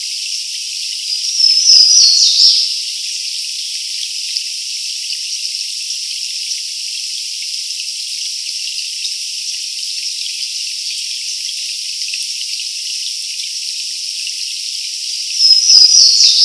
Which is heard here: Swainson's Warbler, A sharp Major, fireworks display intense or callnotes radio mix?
Swainson's Warbler